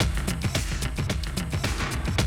110, Classic Drum Loops

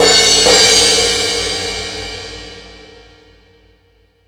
High Hat, E-MU Pro-Cussion

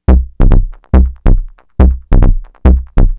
Trance Bassloop 140bpm, Bass Arps; Zero-G Trance Inducer; 90 s Sample CDs

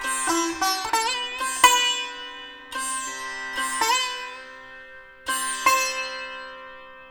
SITAR, Indian Traditions; USB Soundscan vol.45; AKAI

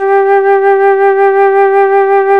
FLT FLUTE, Flt vib; Roland L-CDX-03 Disk 1

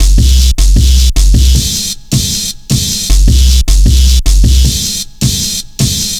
155, Drum Loops; Total Drum&Bass

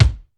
DRY K, drum; Crypton; DrumBase5000

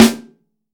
maple snare

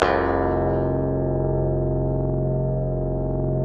GTR E GTR, Clean E.Gtr; Roland L-CD701; Clean E.Gtr3

